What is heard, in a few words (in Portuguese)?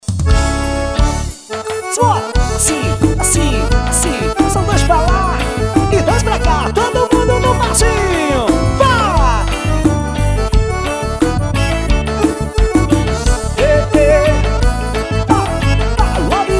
forro ao vivo